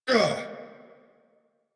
Pain; Warframe; Veilbreaker; Kahl Barks

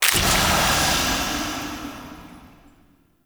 Magic Spore Puff Large, Root Cave; Ambience; Props; Neathuns Root Cave

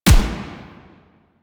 Assault Rifle Fire Initial; Weapons; Warframe; Grineer

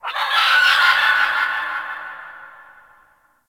Night Distant Creature Small; Ambience; One Offs; Warframe; Eidolon Plains

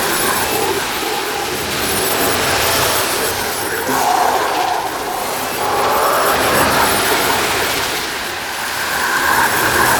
Murmur Portal Shatter Loop; Warframe; Ambience; Duviri

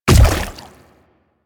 Splitter Step Water, Footsteps; Warframe; Infested; Grey Strain

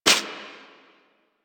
Impact Generic; Warframe; Caliber Chicks minigame; weapons; hit effects

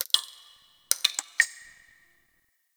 Ocean Interior Drips; Ambience; Warframe; Grineer Ocean

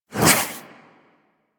Warfan Whoosh; InfWarfan; Warframe; Weapons; Melee

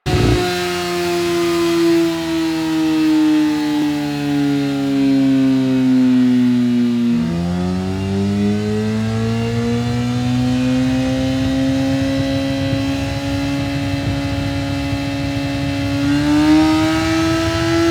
Engine Rev Up, Motorcycle; Motorcycle Ninety Nine

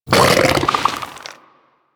Bug Vocal Tranquillized Grunt

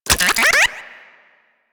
Vomvalyst Lure Move; Warframe; Teralyst

